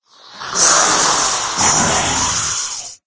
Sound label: idle, maggot; doom3